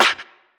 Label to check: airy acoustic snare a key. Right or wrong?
Right